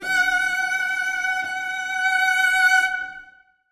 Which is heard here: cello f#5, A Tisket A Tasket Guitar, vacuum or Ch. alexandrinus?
cello f#5